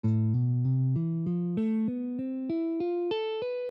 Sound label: A Hirajoshi